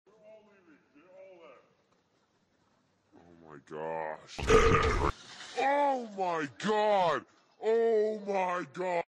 turkey

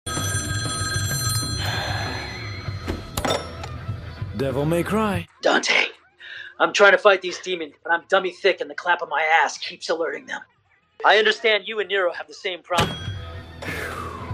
devils cry

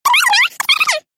clock

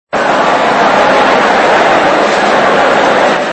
crowd; cave

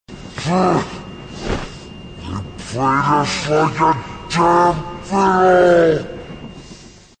fiddle

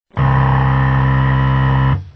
wrong

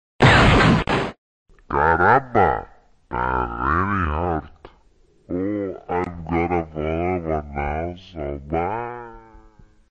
gun

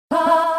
bah

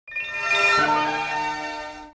computer on fire

